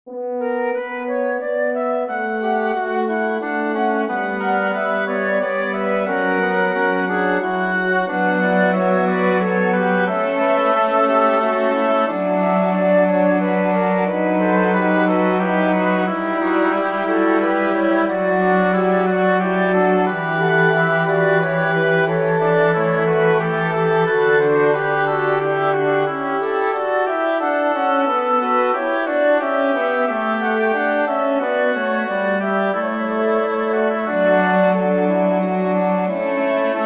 for cello winds; bach johann sebastian; suscepit israel from the magnificat major

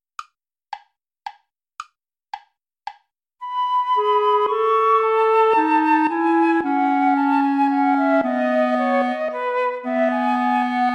minuet major play along without guitar

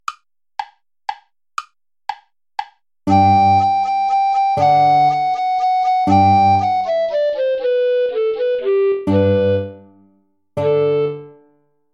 play along without guitar; old hungarian dance

